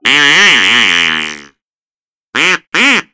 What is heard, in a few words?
Hopping Duck Idle